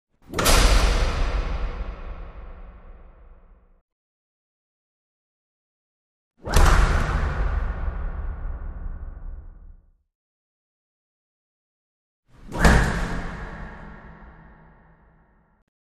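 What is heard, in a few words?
Door Slam Heavy X3; dooro slams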